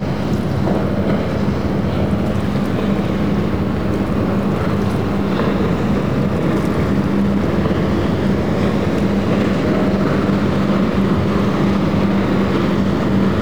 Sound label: city harbour, Ambient; Project Gotham Racing